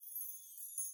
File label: chimes; Blind Drive